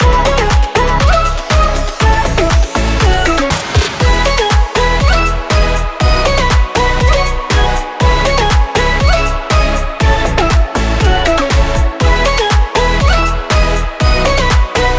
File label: EDM Pop